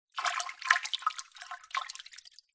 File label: nature water surface agitate swirl finger gentle plastic tub